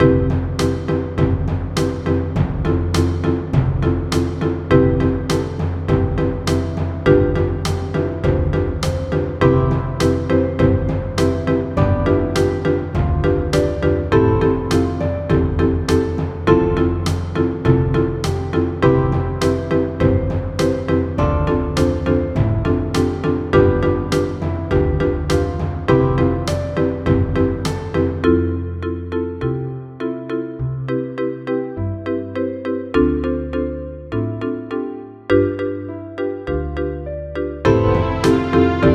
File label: clear path explainer, royalty free commercial music